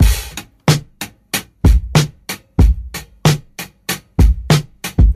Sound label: bumper pool, Drum loops Boombap